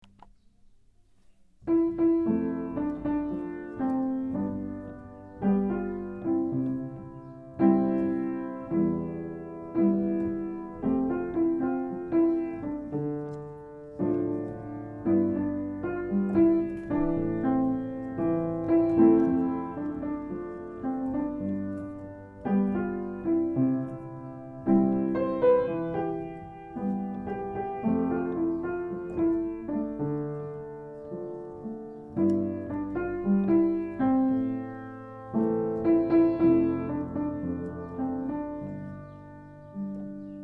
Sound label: piano